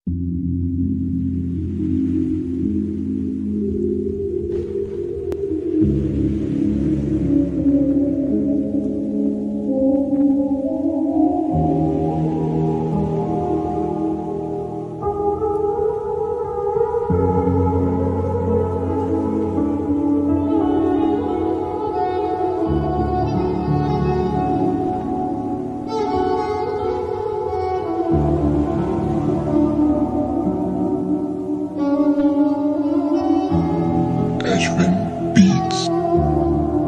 turkish sad violin beat slowed and reverb

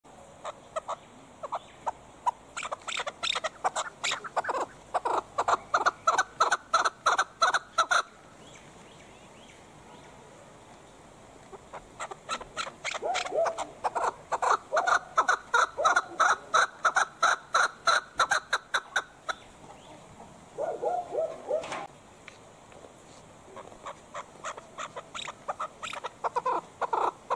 chukar keklik

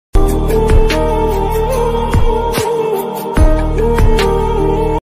turkish sad background music ringtone

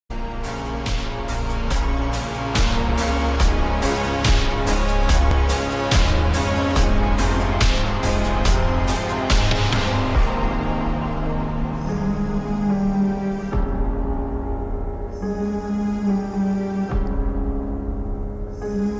slowed reverb sad part

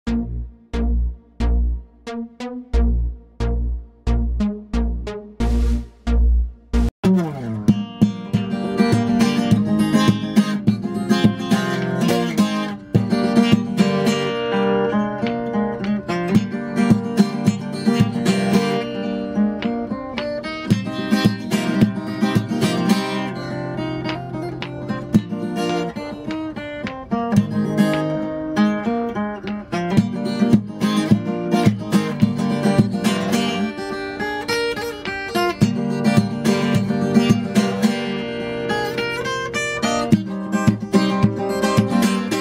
minus bo gitara

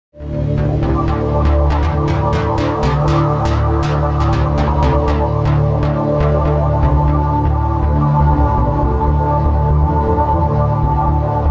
modern beat korg pa900; korg s sergey k

